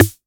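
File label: Analog MT40 Snare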